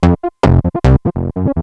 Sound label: ring